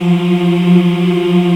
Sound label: F F 3, CHOIR; Spectrasonics; Heart of Africa 1; CHOIR MMS